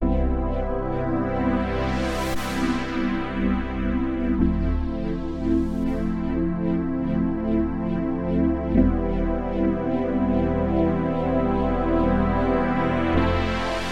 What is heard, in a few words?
riser; orbinator; audiodev blog